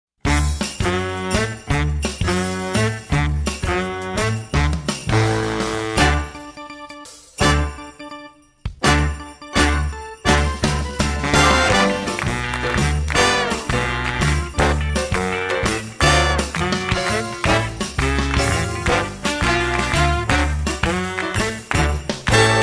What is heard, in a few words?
santa claus rock accompaniment slowed